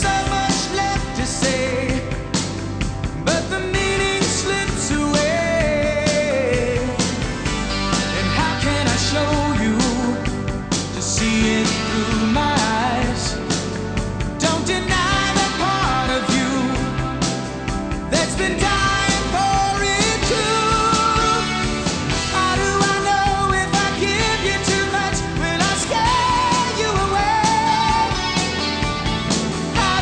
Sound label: rock